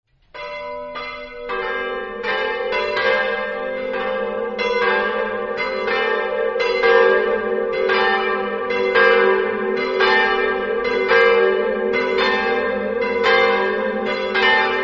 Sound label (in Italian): campane